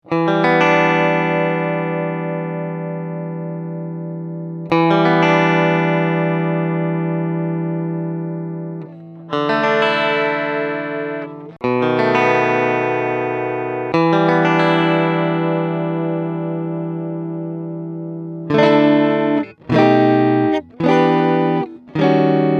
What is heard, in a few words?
Guitar